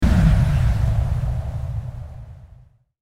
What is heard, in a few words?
End Bomb